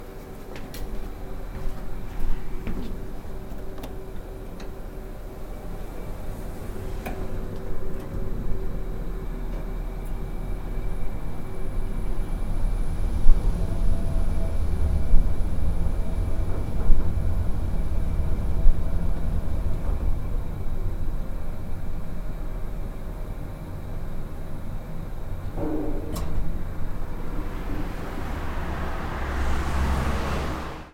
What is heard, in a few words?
elevator down